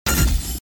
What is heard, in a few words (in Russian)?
pomeha glitch